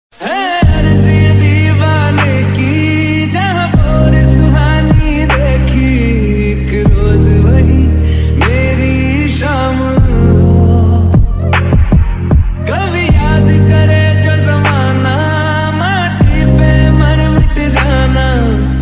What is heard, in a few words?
slowed reverb